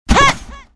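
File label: Npc Ship blue harpy attack; Fly For Insanity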